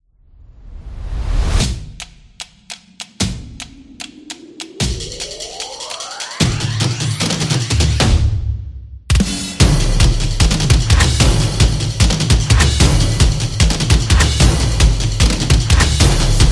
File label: Stomp Dynamic